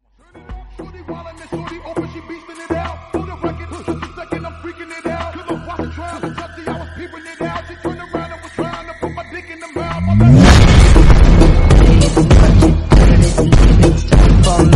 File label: dj bass boosted remix english tone ringtone; galaxy ringtones